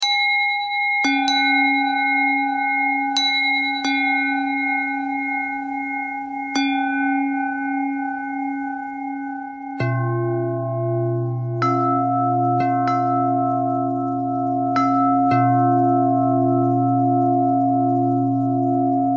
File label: bell bell thread simple, bells